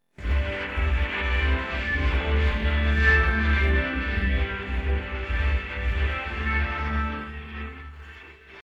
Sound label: no vocals